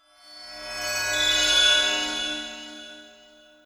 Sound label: magic